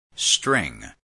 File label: string